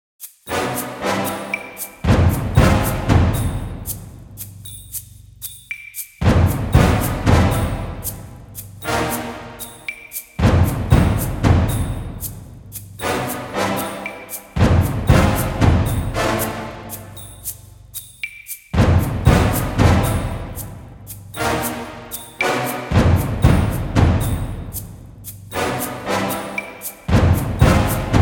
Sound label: Ice Caves Fight; Level3